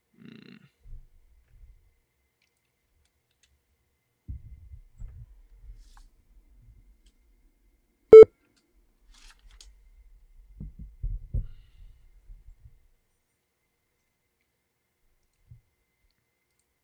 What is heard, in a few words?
wavesh eq kick; Waves H-EQ - Kick Drum